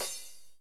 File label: crash